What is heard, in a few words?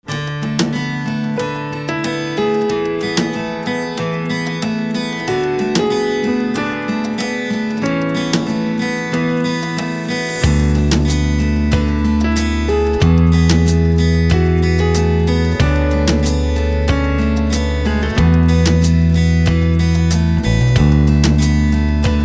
acoustic and piano